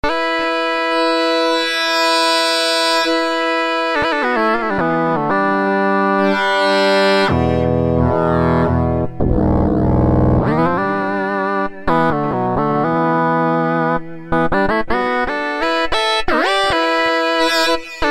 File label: Lonely Fifths, Kurzweil K2000; Kurzweil K2000 V3